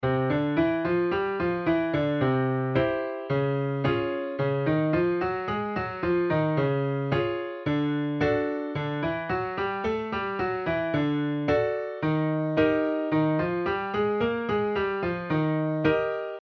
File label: do re mi fa so